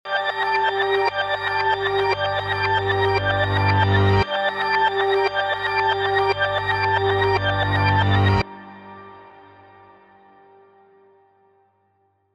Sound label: trap; 8 expansion trap pack bundle; red edition